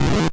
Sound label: stego Crush; Dino Run